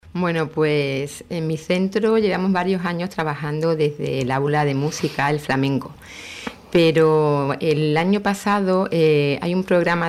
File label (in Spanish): FLAMENCO